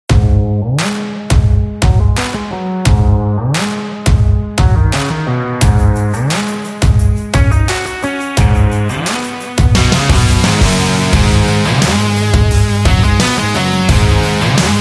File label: This Is Indie Rock